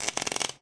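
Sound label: ambience interior arc; Tony Hawk's Project 8; XBOX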